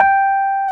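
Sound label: Piano G4 f 73, Rhodes 73 Suitcase; Keyboards of The 60's and 70's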